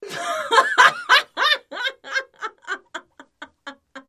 Laughter Female Shrt

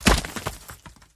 Rock Burst, rocks & dirt

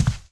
FOOTSTEP, singles

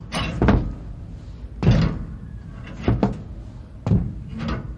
Sub Levers No Voice, levers

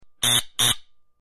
Solenoid Buzzy Rapi, buzz

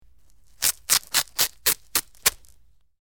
Velcro Rips Close Perspective

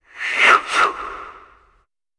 Football Bounce

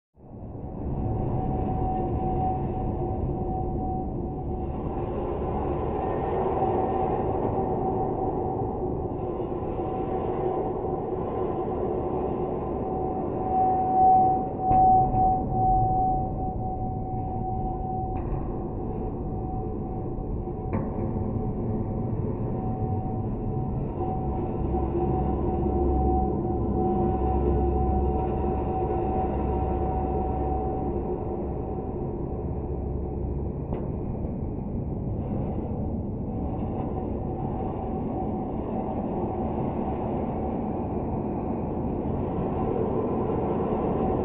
Metal Ambiance, horror

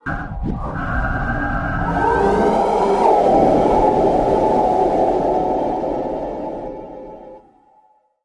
Digital Malfunction, stinger

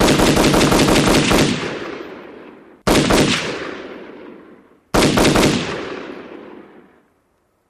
AR15 Machine Gun Mul

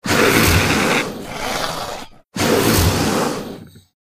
Monster Combine, monsters

